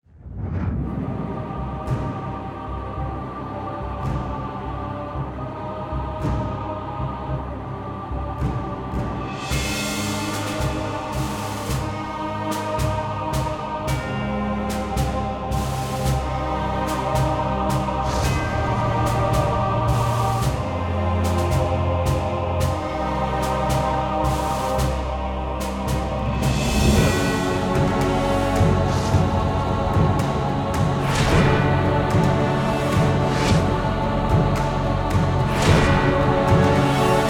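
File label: Humans Ambience; Disciples III Reincarnation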